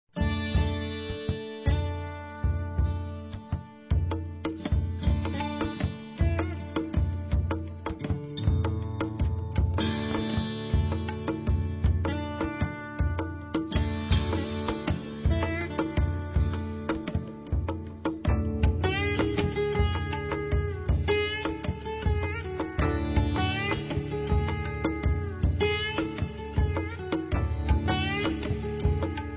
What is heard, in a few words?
Fusion Music Raga; RKC Live Stream; Travel India